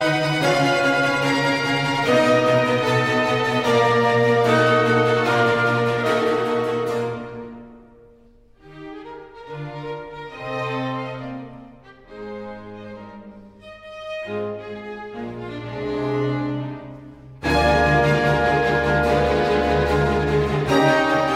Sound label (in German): Sinfonie c-Moll